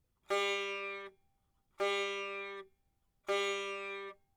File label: sitar